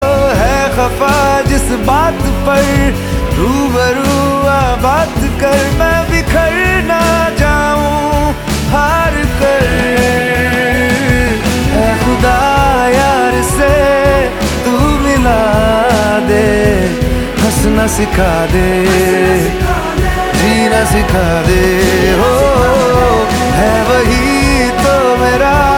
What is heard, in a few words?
Ringtone